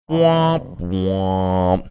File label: whomp whomp